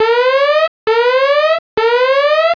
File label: WARNING